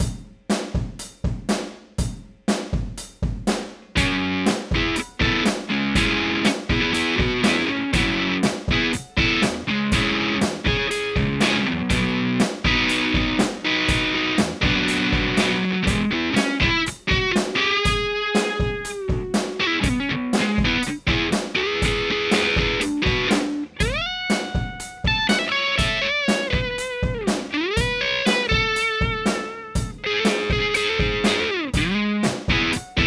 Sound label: drums; apex205